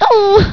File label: OOH; PEOPLE